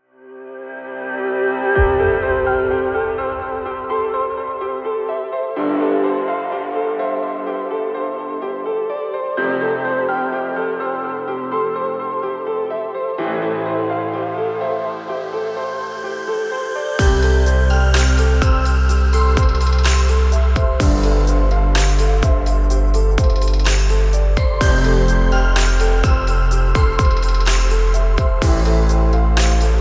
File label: summer winds chill pop